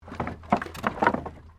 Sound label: Wood Movement Minor; Material components for constructing more complex sounds; Materials